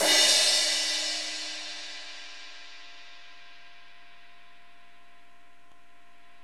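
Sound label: CRSH, crash; Roland LA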